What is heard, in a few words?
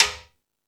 Latin Drums; E-MU Pro-Cussion